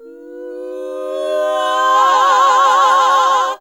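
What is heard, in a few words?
Gospel; Best Service Gigapack 1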